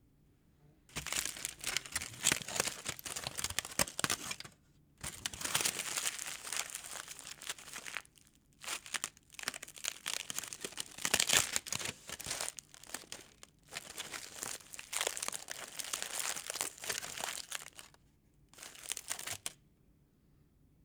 Tin Foil

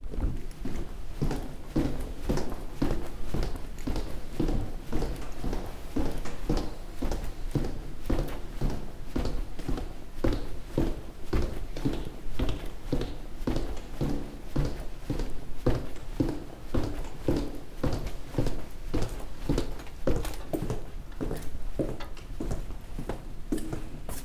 Steps In A Long Corridor

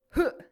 Jump groan

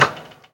Snare

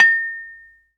Ding

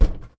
Basketball

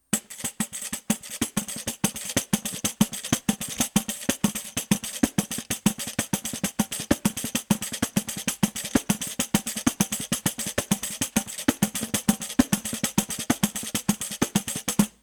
Pandeiro

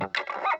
Electric Guitar Noise

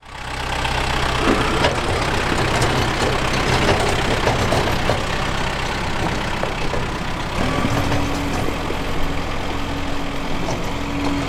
Tractor Drive

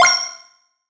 Retro Game Sounds

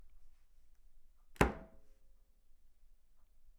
Hitting Table

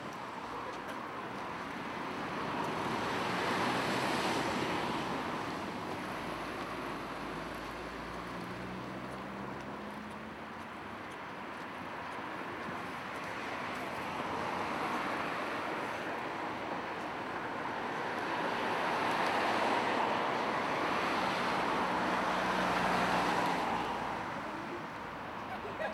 Street Noise

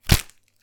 Plastic snatch

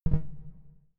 Robot Tone

